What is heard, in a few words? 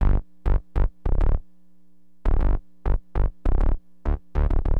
SYN BAS, Acid Jazz Funk; Bass